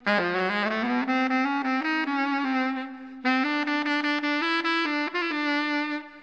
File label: Sax